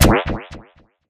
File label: hitsound vortex